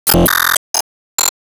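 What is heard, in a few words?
Battle Suport Item Window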